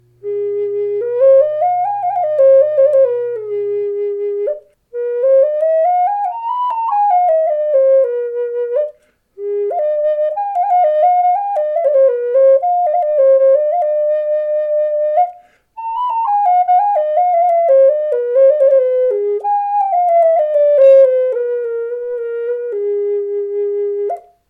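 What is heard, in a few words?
HALFPIPE G sharp 4 Dark Redwood, Native Style Flutes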